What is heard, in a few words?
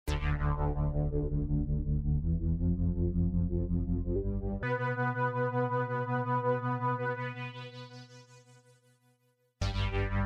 Fat Triangle, Nord